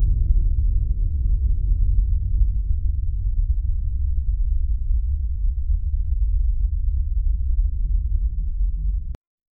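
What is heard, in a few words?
concrete corridor ambience, reflections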